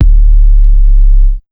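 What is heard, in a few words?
TECH 140 bass drum, Big Beat Drumloops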